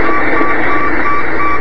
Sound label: old vowels; wof